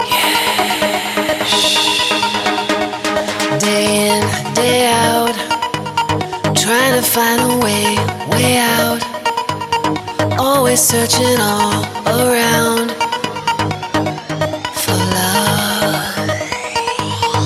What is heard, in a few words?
heart beat